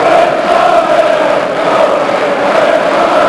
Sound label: Chant, Crowd; David Beckham Soccer; XBOX